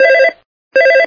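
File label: landline phone ringing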